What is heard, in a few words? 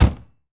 Ferrari Dino Door Close, Automobiles